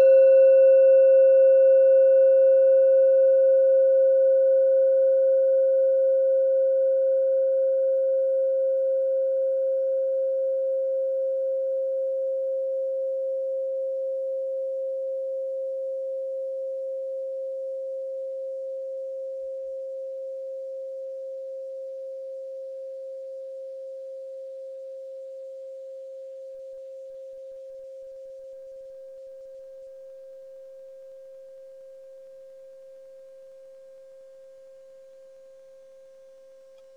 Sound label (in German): kleine klangschale